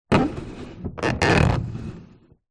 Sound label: household television flat screen slide movement adjust position on table